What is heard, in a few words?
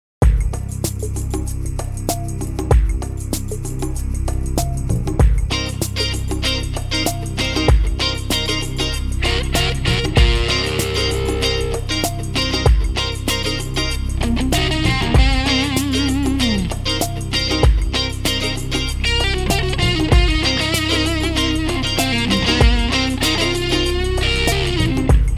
Pedals